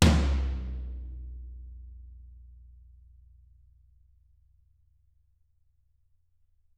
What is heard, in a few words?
TT, Toms; Bob Clearmountain Drums I